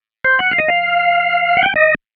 Organ Line

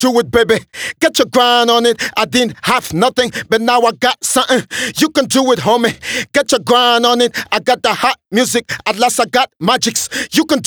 Rap Do It Hook, vocal

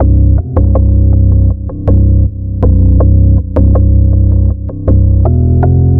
Screwed Bass; ambient

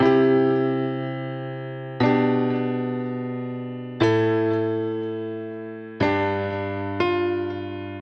Sad Chords; Клавишные, сэмпл; Rock Alternative; 120 BPM; Keys

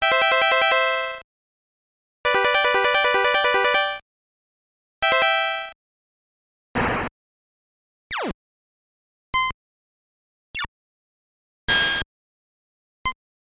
soft sound effects; игровые; star force hudson

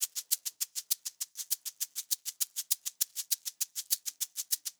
Shaker D; 70’s Funk

